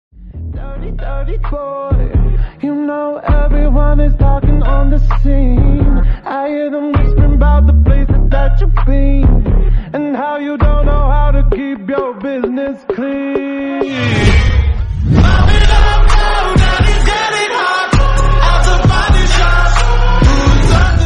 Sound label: English song